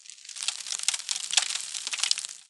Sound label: spore tree pitter patter; dfcaverns; trees